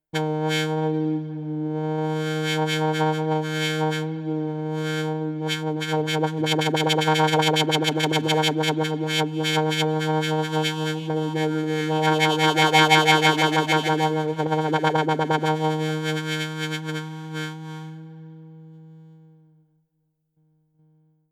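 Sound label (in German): Striso board Ein Ton variierter externer Hall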